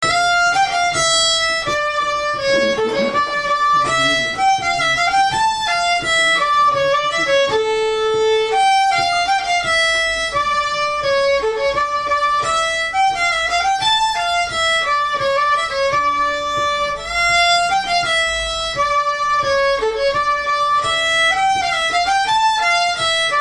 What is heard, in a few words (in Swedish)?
polska